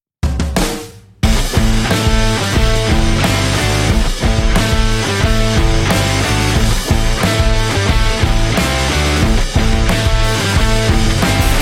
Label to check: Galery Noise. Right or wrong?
Wrong